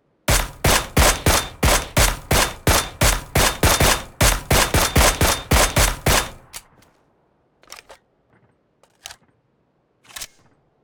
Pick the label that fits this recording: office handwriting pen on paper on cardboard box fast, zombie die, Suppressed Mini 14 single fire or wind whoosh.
Suppressed Mini 14 single fire